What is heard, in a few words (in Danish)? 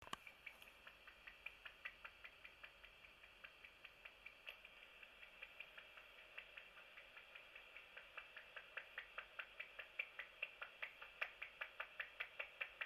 skimmel kald 15 khz